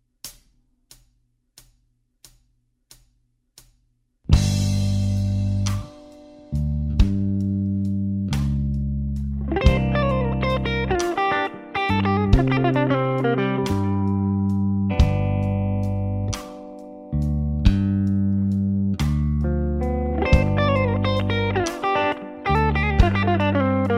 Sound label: G Slow Blues Lick, guitar